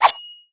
throw, shuriken; weapons; garrysmod

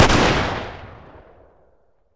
m3s90 fire, shotgun; weapons; garrysmod; m3s90p

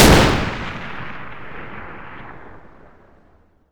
m82 fire, sniper; weapons; garrysmod

